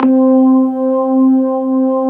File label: B3 HAM, Keyboards Old School